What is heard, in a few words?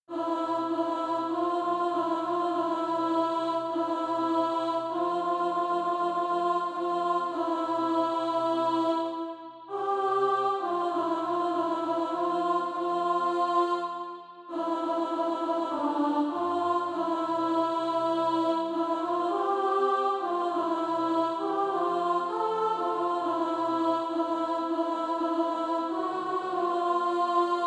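alto, Practice Music; A Glorious Church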